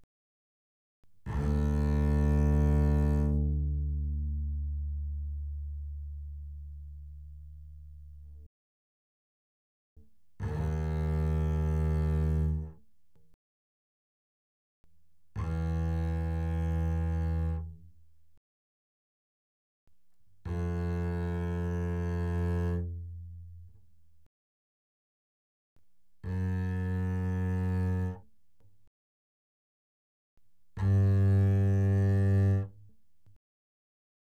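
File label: Bass arco fortissimo sul D D2 B2, doublebass; Strings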